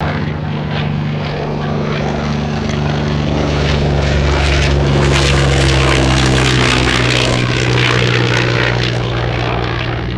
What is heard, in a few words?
vintage bomber aircraft low altitude flyby